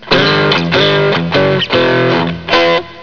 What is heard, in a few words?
lick